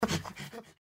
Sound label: gecko panting; npc